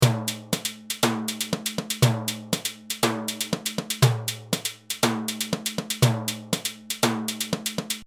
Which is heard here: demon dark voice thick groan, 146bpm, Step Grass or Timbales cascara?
Timbales cascara